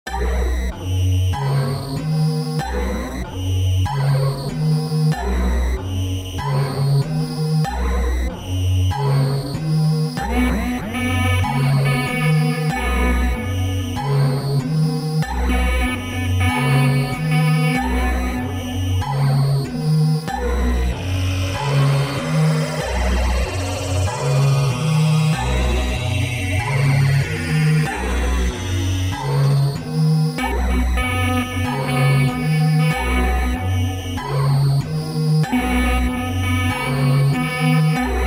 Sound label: failsound; Rafis tkori r